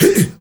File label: HE IHH; Heart of Africa; Spectrasonics; Heart of Africa 1; CH. SHOUT2